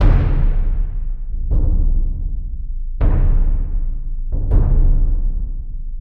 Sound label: Bassdrum; Сэмплы ударных для фильмов: Bass Drum E; Movie Score; 80BPM; Drums; film music